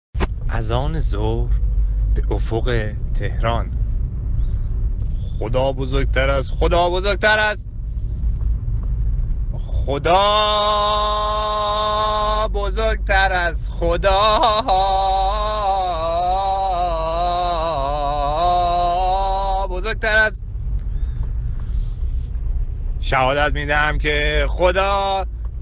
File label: azan farsi; اذان به فارسی